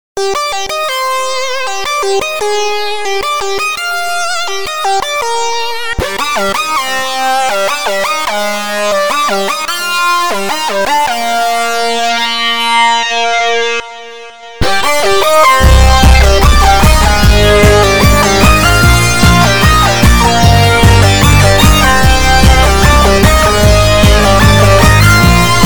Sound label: prophet solo mix; obx